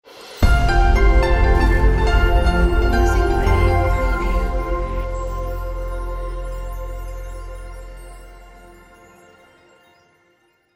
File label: Bright Piano Logo